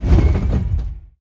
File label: T End Move; DCS